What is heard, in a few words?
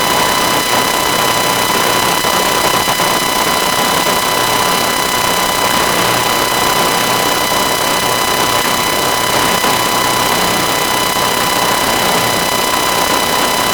usb noise